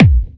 original kick one shot d key, Royality free kickdrum tuned to the D note.